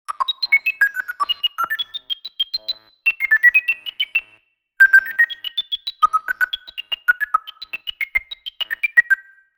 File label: Futuristic text generation long